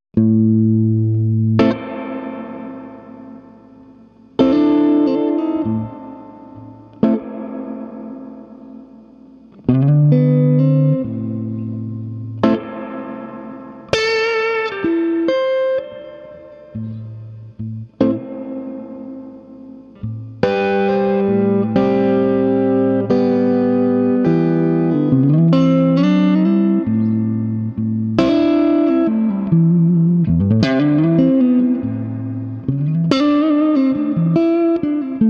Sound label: Swart Amp